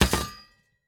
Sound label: water purifier deploy; Rust